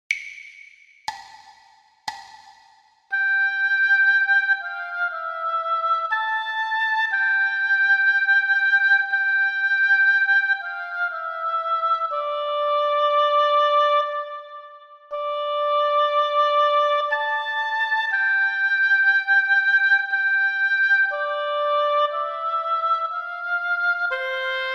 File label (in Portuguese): percepcao ditado